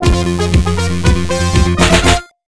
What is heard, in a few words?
Touchdown Stinger; prime time nfl nfl 98 genesis